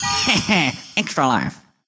pickup extra life; Gold Miner Joe

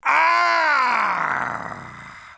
argh

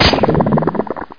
FISH DIES

